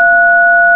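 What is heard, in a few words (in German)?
Tonwahl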